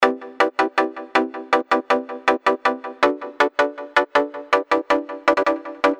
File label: full melody